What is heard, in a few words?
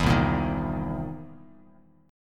C 7th Sharp 9th C Bass Standard; strummed; JGuitar